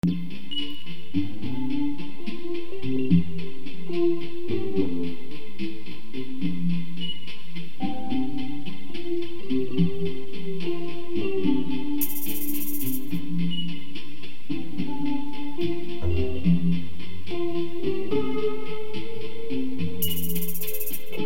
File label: samba